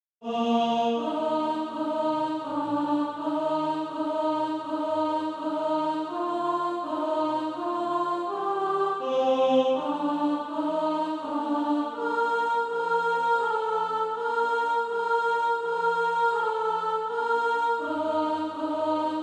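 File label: alto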